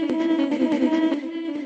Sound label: afro stutter, vocal or voxy loops